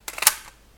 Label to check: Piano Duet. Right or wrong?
Wrong